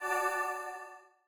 start spin; east sea dragon king

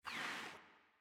bricks Discard; cashomatic

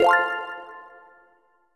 small Win; whos the bride

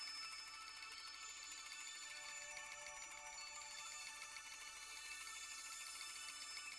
free Spin Reel Spin Loop; fairyhansel

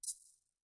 keypad Button Click; colossal pinatas

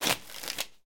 spin Start; arcane

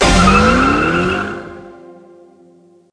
wild Hit; hotline

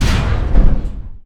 Coin Land In Jackpot; godsoffortune

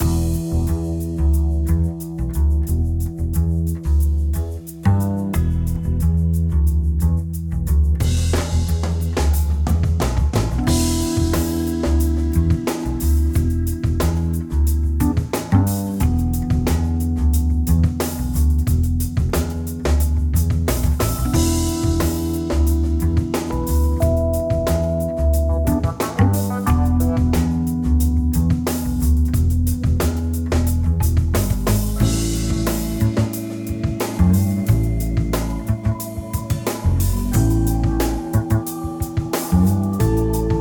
ambience Music, roulette; americanroulette3

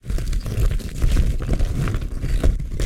near win idle; arcane

